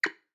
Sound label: ambience room secret room wisp ready